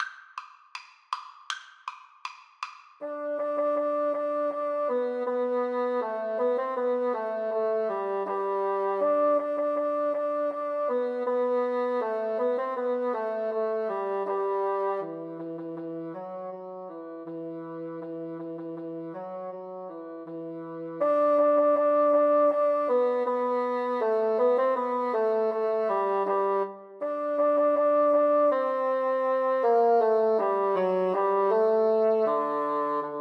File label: duos marcia, Bassoon Duet